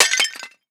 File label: Pot Break Medium